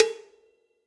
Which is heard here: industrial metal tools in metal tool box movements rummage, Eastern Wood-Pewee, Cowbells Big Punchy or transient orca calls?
Cowbells Big Punchy